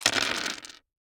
DICE on Hard Wood, Throw and Roll, Standard, 16 Sixteen Dice; Samples; ArticulatedSounds; DICE Sfx Party (Extended)